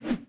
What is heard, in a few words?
fat woosh miss; DoomRTX